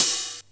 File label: Drum Hits Open Hat; algorave